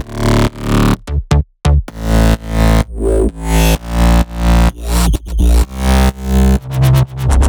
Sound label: 128BPM Bass Root D; Uprising; Vengeance Samples; Uprising Kit